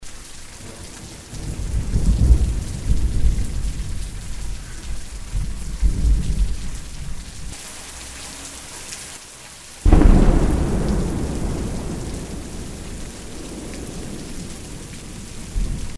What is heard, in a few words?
Heavy rain